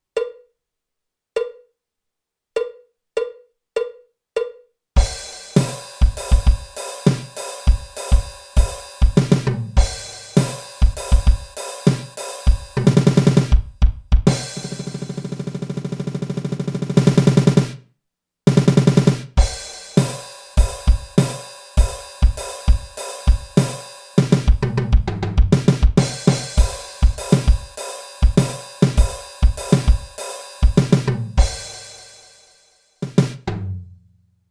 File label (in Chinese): 架子鼓独奏 摇滚融合; 66条架子鼓独奏SOLO